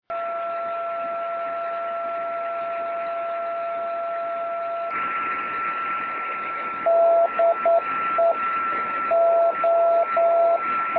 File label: 23cm beacon; oh6shf; sk0uhg